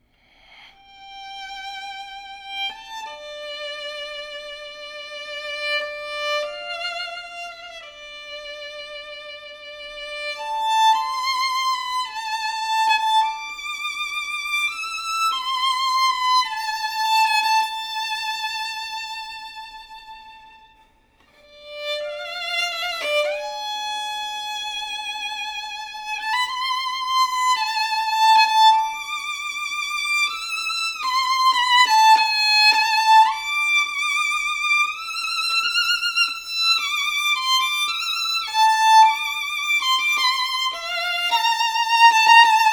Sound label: Violin